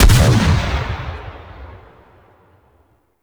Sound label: Shotgun Alt Proj Explosion; Weapons; Warframe; Tenno; Revenant Shotgun